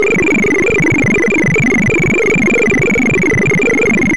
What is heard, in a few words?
amoeba and magic